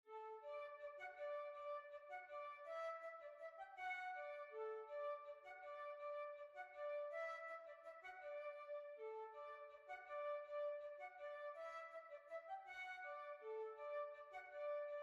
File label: Waltz